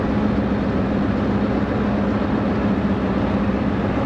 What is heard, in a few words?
Dr12 run; finnish